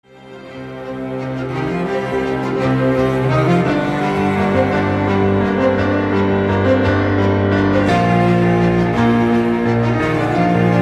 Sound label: Piano; Game of Thrones Piano